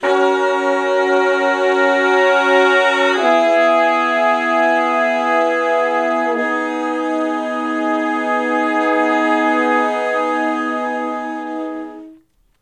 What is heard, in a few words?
Slo Minor Blus Chords (V,IV,I,I) (Ab); Sections; Crimson Blue and Fabulous Horncraft 4 RnB; Horncraft for R&B